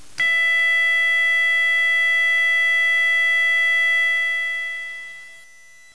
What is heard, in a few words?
Tone Continuous at 660 Hz Steady